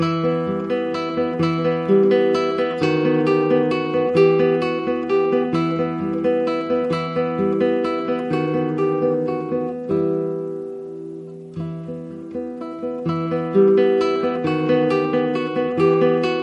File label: waltz guitar ringtone